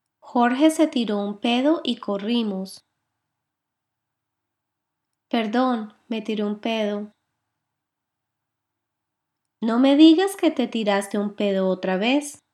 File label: flatulence